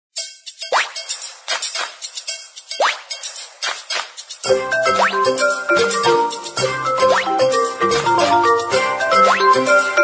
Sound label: Pretty Latin; Samsung; SCH-i539; Mood